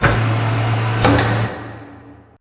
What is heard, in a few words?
Assembly Plate Lift Up Metal Start; Boomtown; Titanfall 2